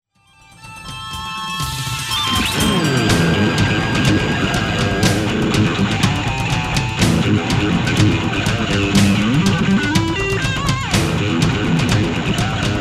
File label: guitar solo